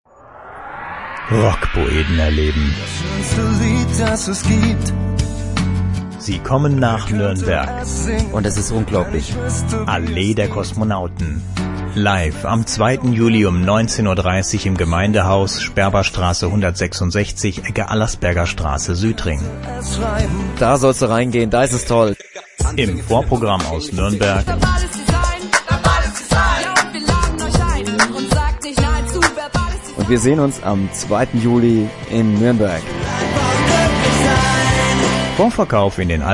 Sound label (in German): konzert jingle